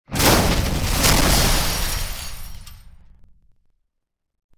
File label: Explosion; Cosmic Rage; combat